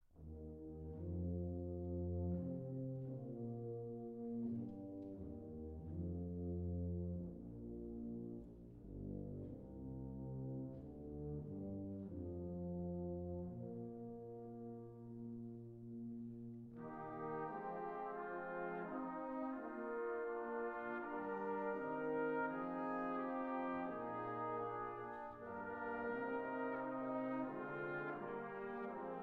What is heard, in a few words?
Suite No. 1 for Military Band in E-Flat Major, Op. 28 I. Chaconne; Holst; H. 105